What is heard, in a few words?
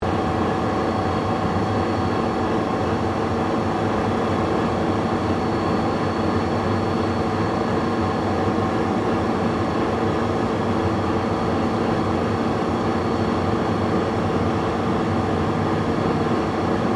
Industrial Fan, Factory, Loop; Factories